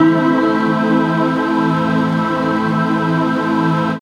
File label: MELLOW CHORD, DRONES & PADS